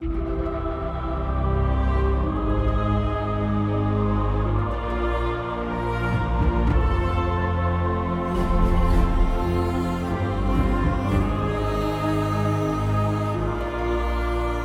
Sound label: Music 5v5